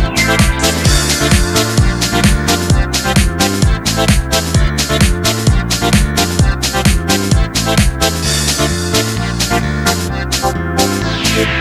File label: Instrumental